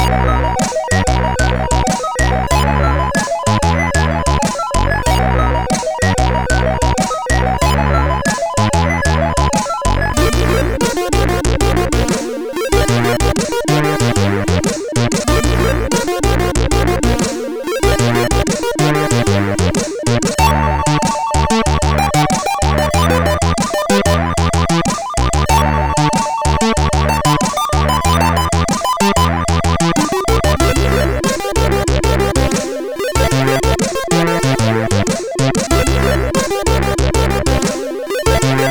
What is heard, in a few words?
Bended Wave sid CSG8580R5; hvsc